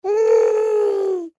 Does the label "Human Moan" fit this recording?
Yes